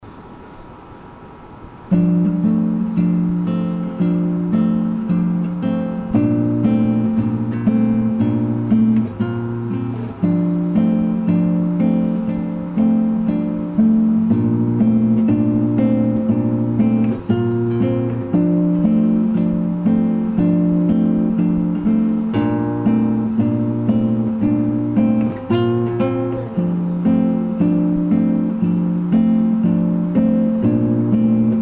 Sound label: Guitar